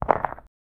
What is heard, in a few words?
stone footstep